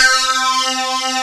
SYNC LD C3, Analog; USB Soundscan vol.49; AKAI; ARP

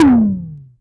tom, Korg Prophecy

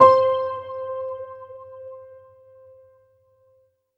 Piano C (single note); Sonic Foundry (Sony Creative Software); Ugly Remnants VOL-2

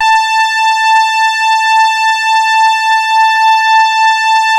TARK SAW, Synth; InVision Interactive; Keith Emerson; The Most Dangerous Synth and Organ; SYNTH LAYER

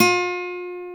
GTR, Nylon Chorus; Roland LCDP02 Guitar and Bass; Nylon String

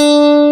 D3 STRAT, Bass Guitar; AKAI S6000 CD-ROM - Volume 1

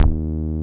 SPIKE BASS; Masterbits; Dance Trax

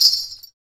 PRC METAL, Afro Shakers, Ankle Pods; Roland LCDP14 Africa VOL-2

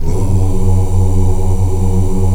AFROOO F#2, AF.VOWELS; Spectrasonics; Heart of Africa 1

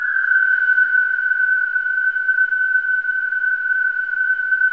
WHISTLING; Spectrasonics; Symphony of Voices

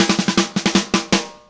Happy Pub Beat Intro, Indie Rock; Sonic Foundry (Sony Creative Software); Indie Rock Anthems; Session 05 - Happy Pub

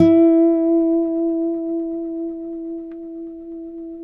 Finger E 4 L, Guitars; Spectrasonics; Hans Zimmer Guitars VOL-1

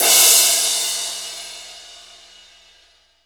KOMMERZDRUMS

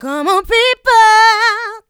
Female Vocals; Houseworx

